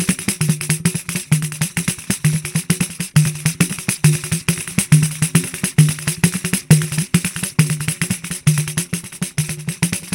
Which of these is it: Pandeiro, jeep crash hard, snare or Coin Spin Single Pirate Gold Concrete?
Pandeiro